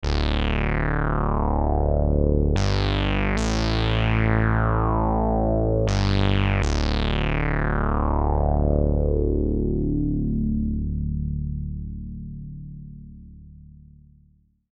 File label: ResoBass